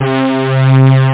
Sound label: trump chord; shockwave